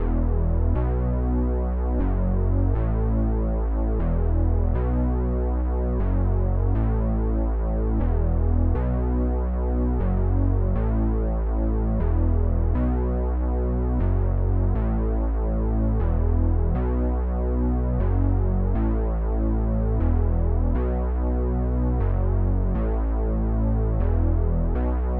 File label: 120 BPM C# Min Bass, 1980s; Cymatics; GHOST (Beta Pack); Arcade